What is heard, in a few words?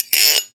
Gyro, Percussion; Soundopolis